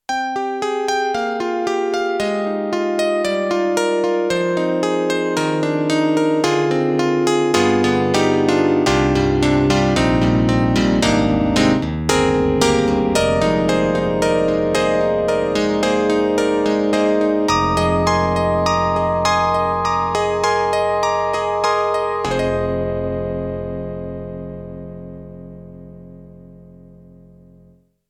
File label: YAMAHA MOTIF-RACK ES Hard CP80